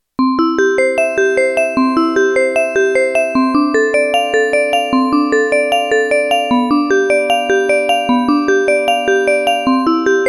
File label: bach xylo